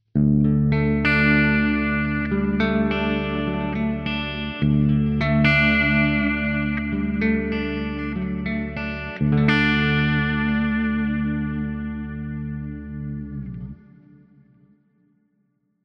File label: Clean Shimmer Mod; Gojira Plug-In Suite